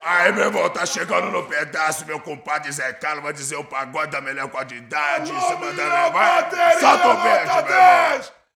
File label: BRAZIL, World Voices